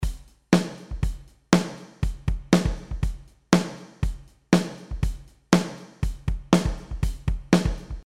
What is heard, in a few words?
Drums Reverb